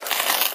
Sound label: cardboard box strain; physics; Source Engine; cstrike